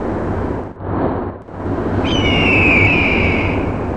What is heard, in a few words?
AMBIENT; lomax